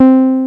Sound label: 303 BASSES; Pro-Rec - Synthsonic